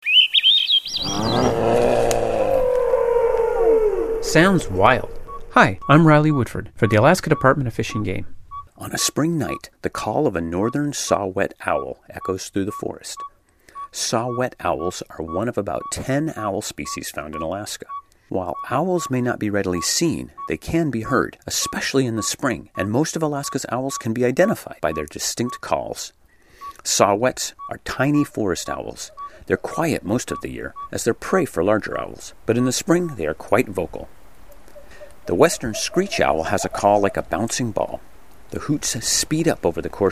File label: forest owls